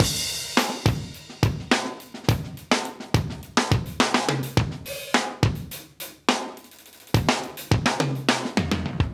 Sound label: 105 Groove Solo, breaks